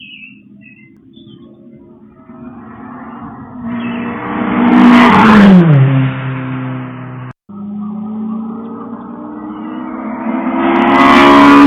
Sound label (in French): voiture; une rue paisible qui se transforme trop souvent en piste de course